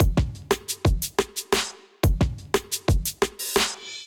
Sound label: Groove 118 Bpm, Drums; MIDI Clips; Kingsway; Ableton; Factory Packs; Chop and Swing